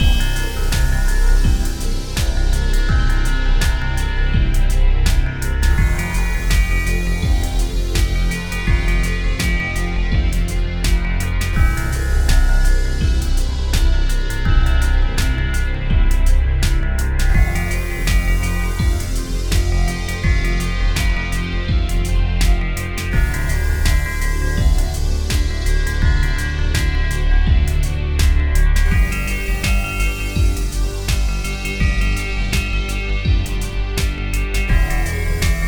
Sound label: Bell Tower, fantasy music